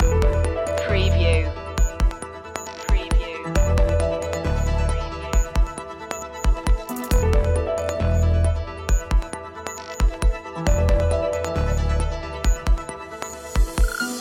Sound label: digital environment loop